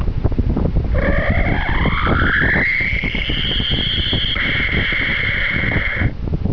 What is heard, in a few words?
phaser